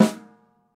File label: verby snare drum sound g sharp key; snare drum samples